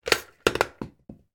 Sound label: game pirate figure pop out of barrel